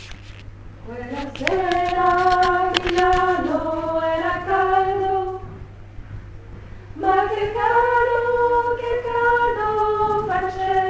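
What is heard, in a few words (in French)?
voix haute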